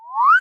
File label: Fade In; Robot 64